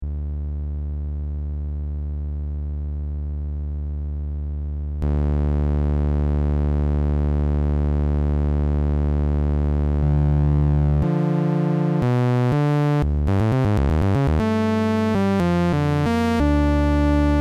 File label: sawtooth